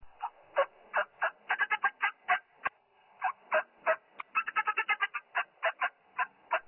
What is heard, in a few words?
yelp cack